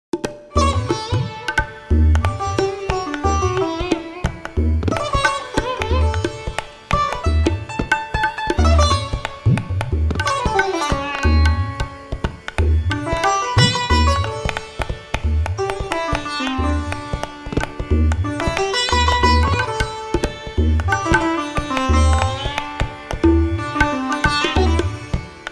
sitar; sitar nation; trad

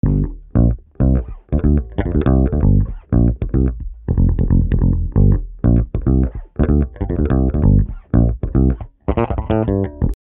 new orleans bass

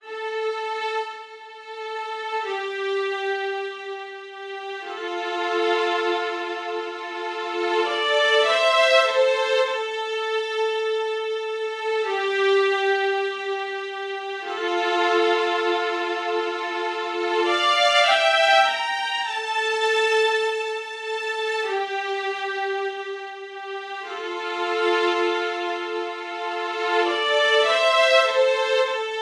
violin strings film eq

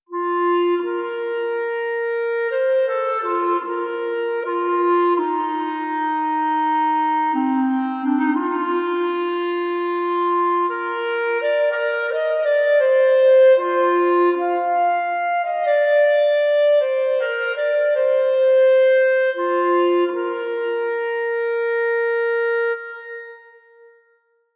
pure space clarinet

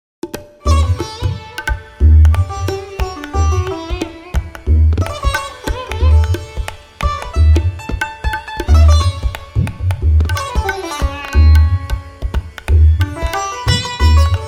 sitar trad 98hz; sitar nation

